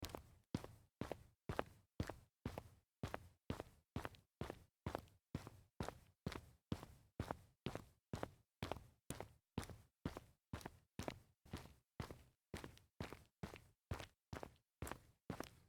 sneaker fast walk concrete

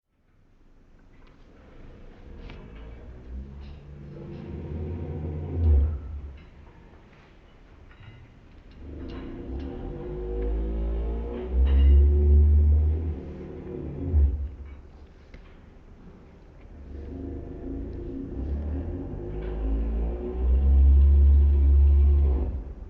tow truck engine pulling car

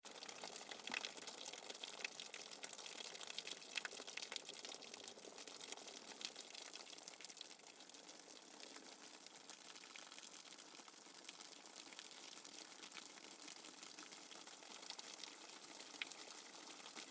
rain water dripping fast hard

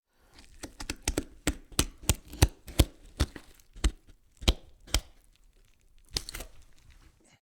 meat preparation

